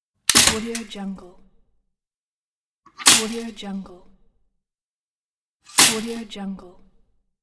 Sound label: toaster